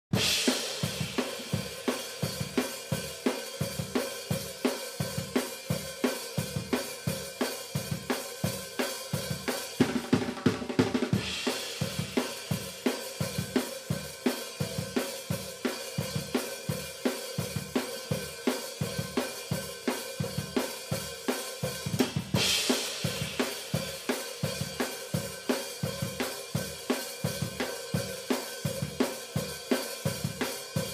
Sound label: overheads original